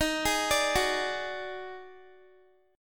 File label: Eb Minor Major 7th Double Flat 5th, down, Guitar; EbmM7bb5; arpeggiated